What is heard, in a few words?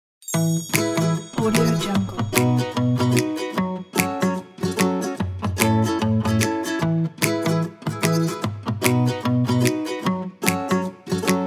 happy acoustic reggae